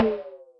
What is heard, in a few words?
Eno rim, Electric Snare, Yamaha DTXpress